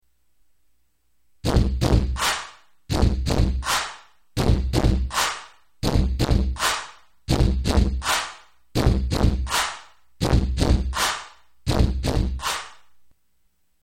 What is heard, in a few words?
heart